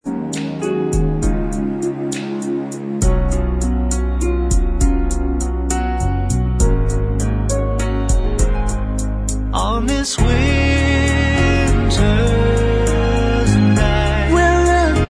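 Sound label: backing vocals Track, backing tracks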